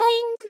Boing; SM64 CO-OP; Triple Baka Pack; Miku

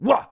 yah wah hoo; foreman spike; SM64 CO-OP

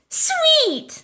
haha; daisy; SM64 CO-OP

